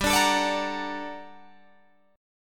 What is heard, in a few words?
G Minor Major 9th, down, Guitar, Open D